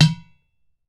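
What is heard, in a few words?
UDU FINGER, African Perc; E-MU Formula 4000 Series Vol. 4; Earth Tones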